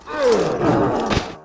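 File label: Metal elf Knight Die; Mob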